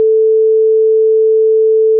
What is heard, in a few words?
A sine